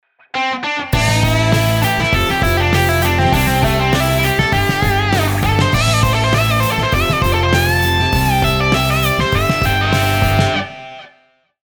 guitar pattern

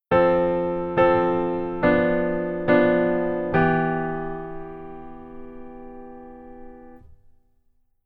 Keyboard Voicing Rootless Pf Only

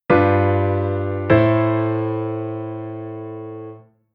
Chord Cadence

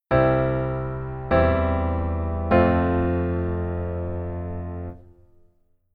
keyboard voicing low

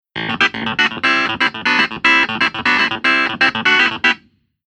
keyboard clavi amp

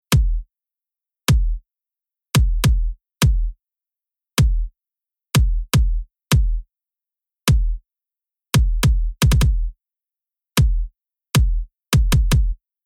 FutureBass Mix Rhythm Bass Kick

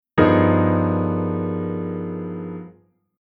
Chord Tension Dominant V7 b9 b13

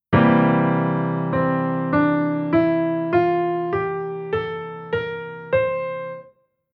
Chord Chord Scale Sec Dominant

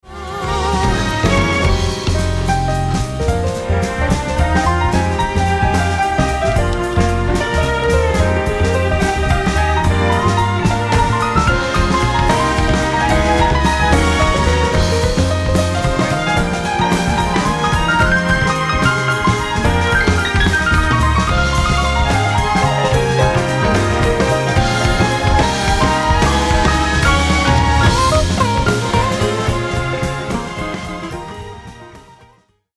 Vol Automation Strings Auto Off

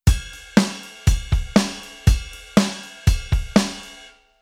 Rhythm

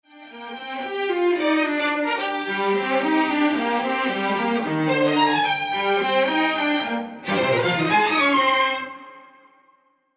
Imager Width50

